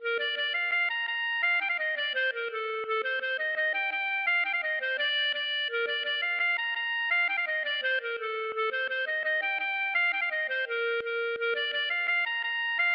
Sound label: gavotte; Du Lorzh